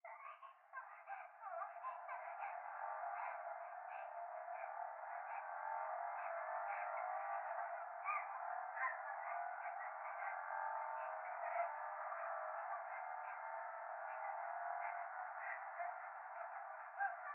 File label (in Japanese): 風呂 可愛い喘ぎ 車の中